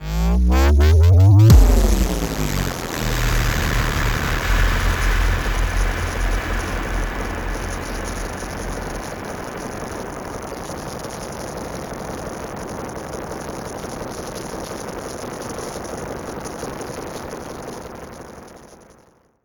Boom; Luna Boom; mahouteq

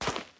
Step Path

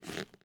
Clothing

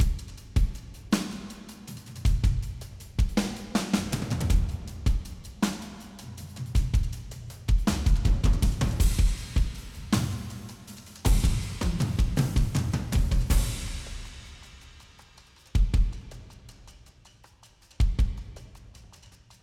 Combat Threat 160 C; Combat Area B1 Threat3 160 C 34 4

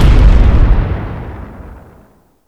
Explosion Debris

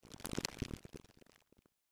Passby Debris

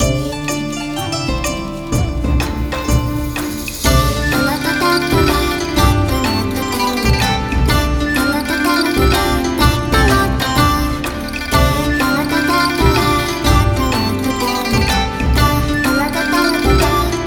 Oriental Wave Remix; Wuthering Waves

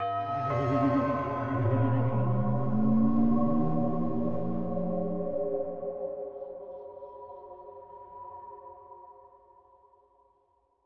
Fog Rise

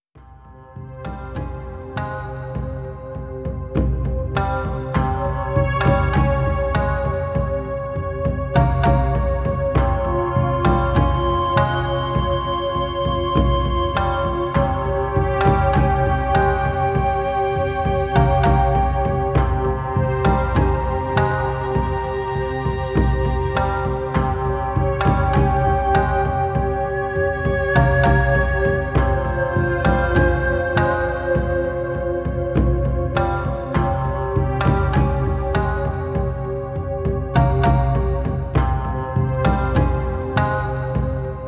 Village Ending; Hayabusa; NINJA GAIDEN: RAGEBOUND

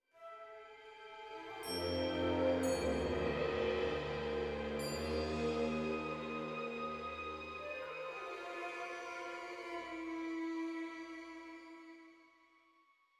Level Start; Bendy: Lone Wolf

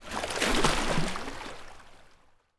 Water Splash Soft

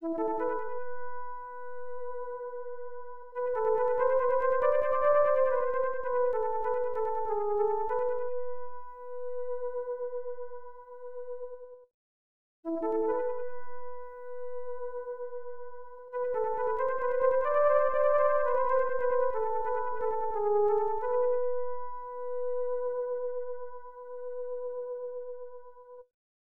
Flute; Mid Garts; PC-88

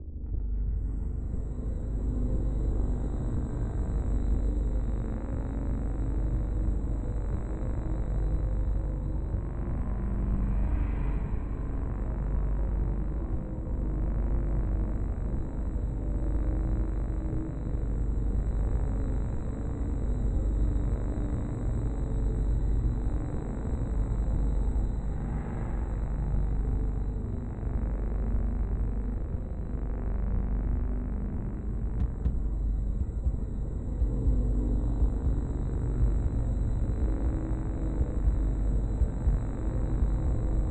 ambient noise; gamerip; The Salesman; Salesman ambient noise 2

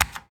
Key

